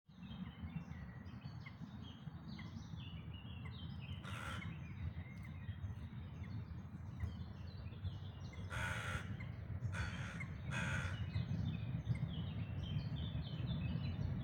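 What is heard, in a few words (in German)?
Dawn Chorus, Vogelgesänge vor Sonnenaufgang; Dawn Chorus 2025; Nebelkrähen; Teichhuhn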